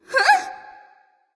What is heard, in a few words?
nv surprise; smite; awilix